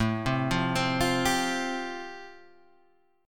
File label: A Minor 7th Sharp 5th, down, Am7#5 chord; arpeggiated